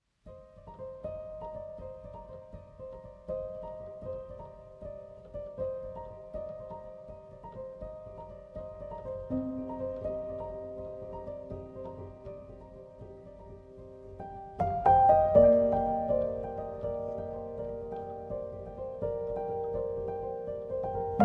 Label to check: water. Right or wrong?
Wrong